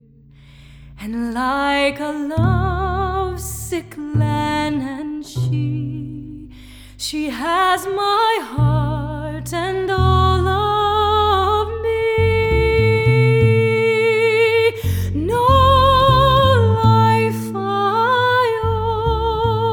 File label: Two Irish Songs